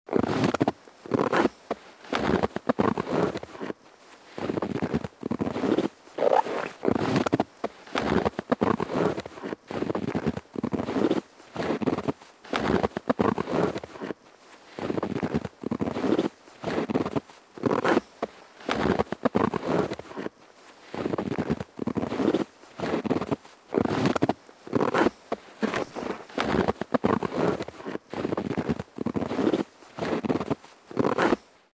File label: Snow footsteps